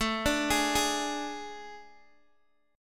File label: A Major 7th Suspended 4th A, Guitar, Open G